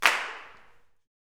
CLAPS, Gospel; Spectrasonics; Vocal Planet Vol.1 Gospel; SNAP

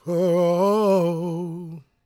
GOSP MALE, Vocal Planet Gospel

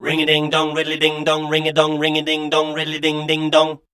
SCAT #D, Voice

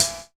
HIHAT; E-Lab; X Static Goldmine 2; akai

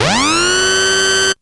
FX

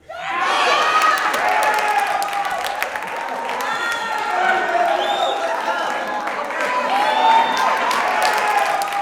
JUBILATION, Gospel church; Spectrasonics; Vocal Planet Vol.1 Gospel; akai

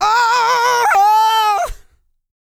GOSPEL

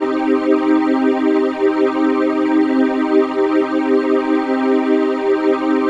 PHASE PAD, ATMO PADS; Best Service; XX Large

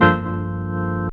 ORG CHD; E-Lab; X Static Goldmine 2; akai

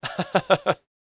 LAUGH, Vocal Jazz Fx

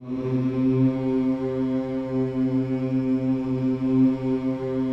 HMMS C 3, Vocal Planet Gospel; Spectrasonics; Vocal Planet Vol.1 Gospel; akai

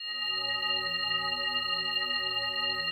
PAD; Best Service; XX Large; Pads

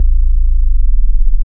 BASS

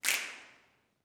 SNAPS, Gospel

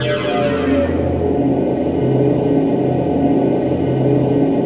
ATMOPAD; Best Service; XX Large; Pads

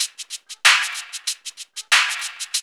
LOOP; E-Lab; X Static Goldmine 2

